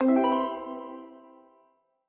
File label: line win; Fishing For Gold